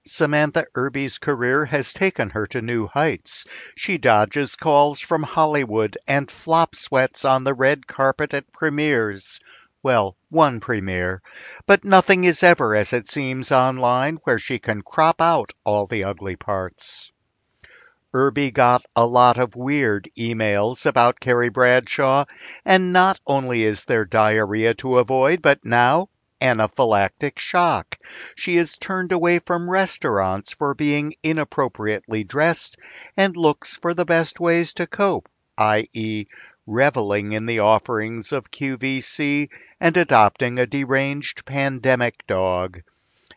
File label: quietly hostile